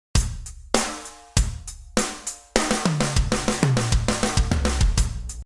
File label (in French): fill avancé sans accent, batterie